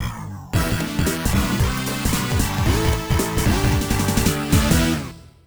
Defeat BGM; premier soccer arcade gamerip 1993; Dispiacere

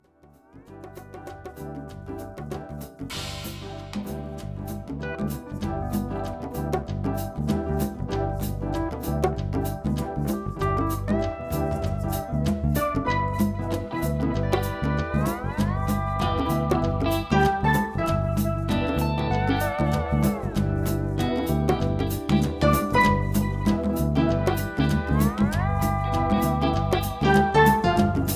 Bongo

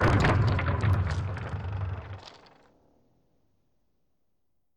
collapse wood; Kismet

